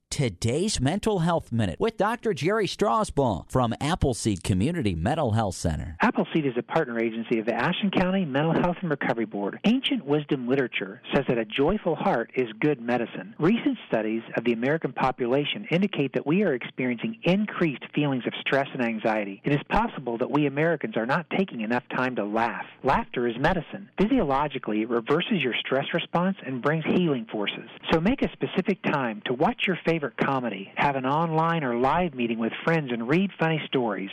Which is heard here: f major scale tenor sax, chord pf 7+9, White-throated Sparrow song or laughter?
laughter